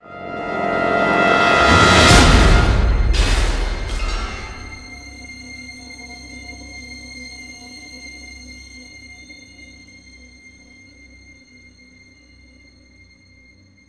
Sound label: STRING SWELL DRUM HIT; transformers war for cybertron gamerip; IACON RUINS